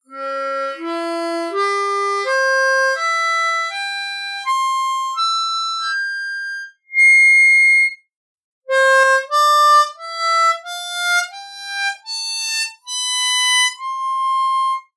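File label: Arpeggio and Scale, harmonica; Eastar; Major Blues Harmonica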